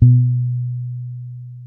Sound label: B 3, ragga, bass; Spectrasonics; Bass Legends CD 1